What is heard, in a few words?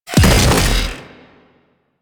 Wall Eye Explosion; Warframe; Sentient Devourer